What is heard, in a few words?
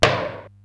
SDS9 RM, Simmons SDS-9, Rimshots